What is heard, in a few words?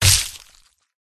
cat attack contact bottom